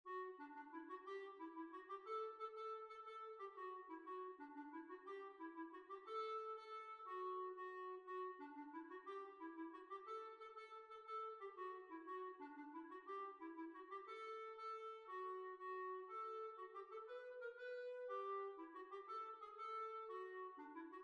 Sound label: Jig